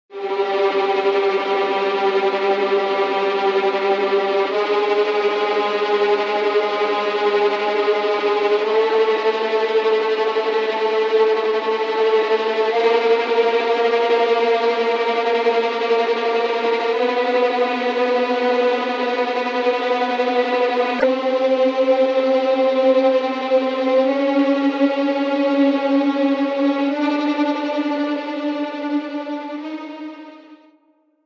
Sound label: Scary Halloween Sounds Suspense Strings